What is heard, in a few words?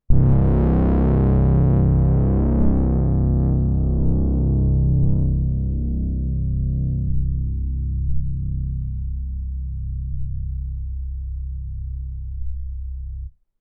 BASS (C), Pedal One Shots; Beverly; Cymatics; ANALOG EVOLUTION Beta